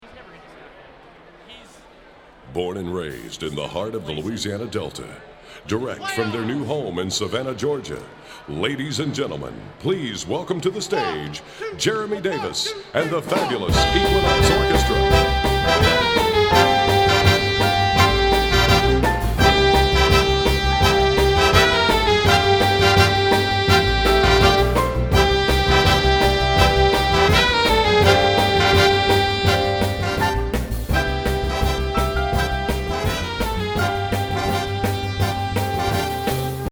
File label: Big Band Opener